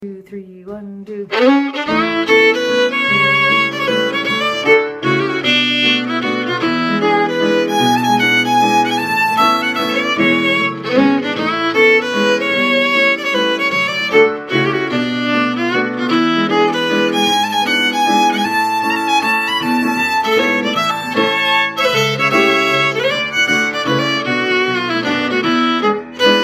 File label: Waltz G; Valses